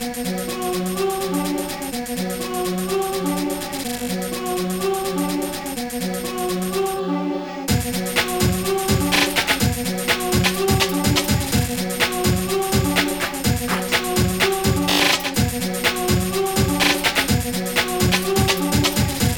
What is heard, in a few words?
jungle howl; nattens jungle; Demonoid Productions